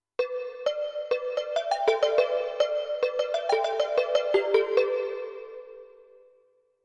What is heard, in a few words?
Thai Bells